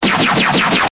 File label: shooting sound with highe, gunfire